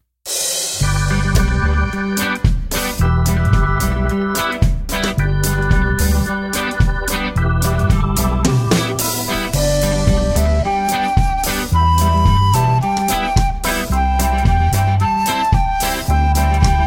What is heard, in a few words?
Instrumental